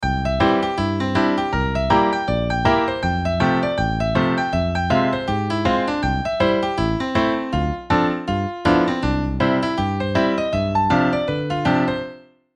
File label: 8bar improv